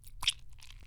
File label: slosh; Lewd SFX